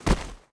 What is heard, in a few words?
bodyfall grass, foley